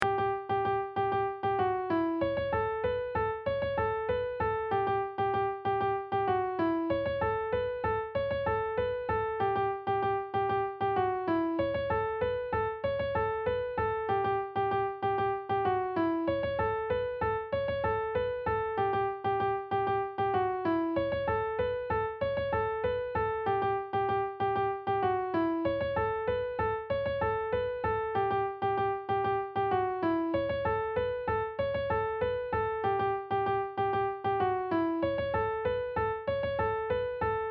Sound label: Holler Up Violin Practice Part